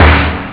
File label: blam, drums snare; OctaMED SoundStudio V1